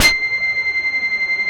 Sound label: Korg POLY800 C5; Back In Time Records; Korg Universe VOL-1